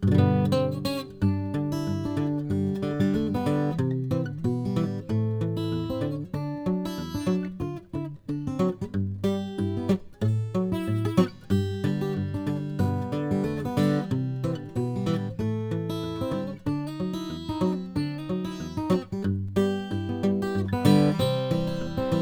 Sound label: Model J Brazilian, guitars; James E Wood